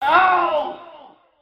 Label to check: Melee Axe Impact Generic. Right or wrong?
Wrong